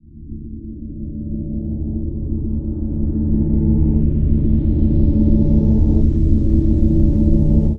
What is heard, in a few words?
Dark Transition